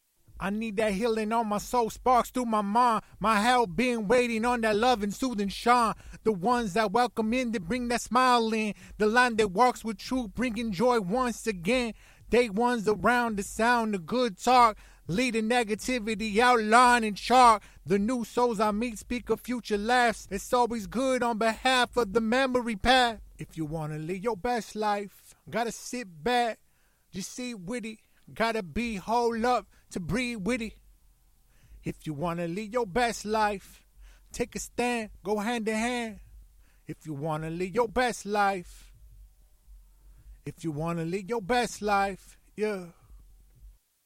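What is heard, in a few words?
hip hop 88 Bm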